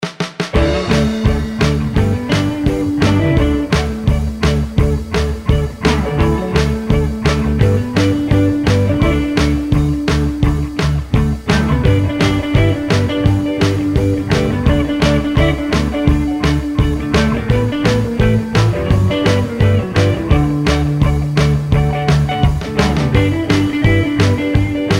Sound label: Hippie Trip, Music background pads